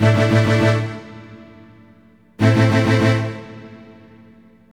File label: strings paced, Sad; Loops